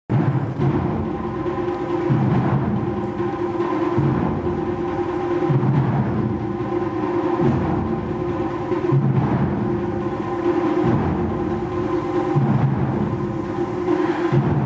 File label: Sounds of Kyoto Drums